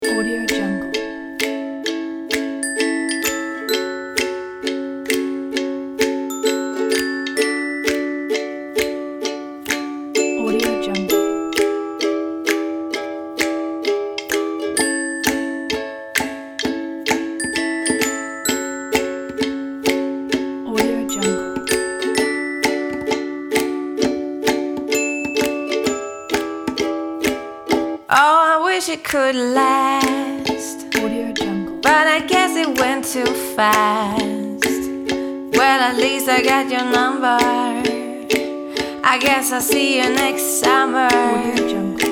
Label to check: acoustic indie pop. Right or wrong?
Right